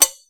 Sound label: Metal